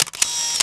camera; Grand Theft Auto Vice City; XBOX

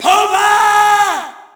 Shout, audience; UFC Tapout 2

